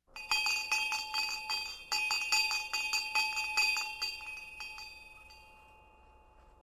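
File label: school bell; shkolnii kolokol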